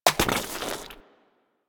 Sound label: Pit Monster Pickup Impact; Warframe; Infested